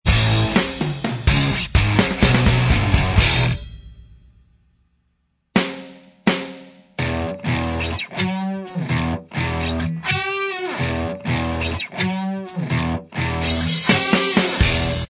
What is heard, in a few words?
Inst Track